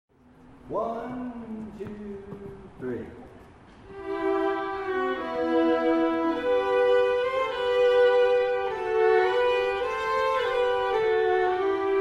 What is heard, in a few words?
Tune Harmony, fiddle